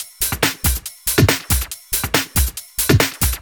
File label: Loop 140 BPM; vengeance essential club sounds-3